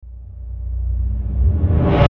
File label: Whoosh Delayed Reverse Ascend; Blastwave; Whoosh Sound Effects